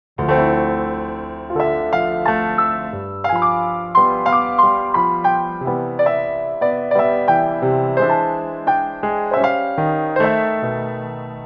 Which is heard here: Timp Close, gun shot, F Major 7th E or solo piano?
solo piano